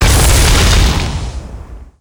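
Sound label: attack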